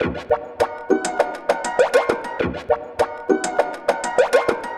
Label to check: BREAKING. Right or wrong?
Wrong